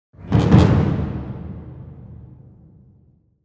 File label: orchestra hits